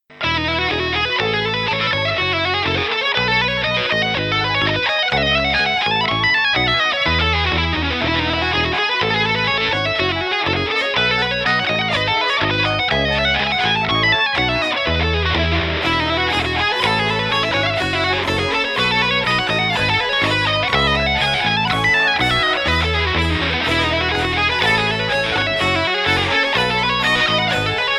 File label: melodie